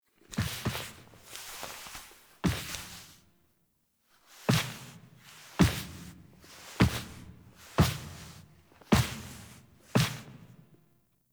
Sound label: Return Foley; Warframe; The New War; Cinematics; Orbiter Return